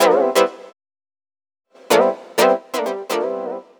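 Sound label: synth, Roots Funk; USB Soundscan vol.41; AKAI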